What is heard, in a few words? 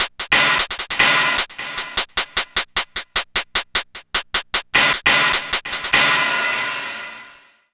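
NAKD hi-hat, drum loop; Big Fish Audio; Uncivilised G'rooves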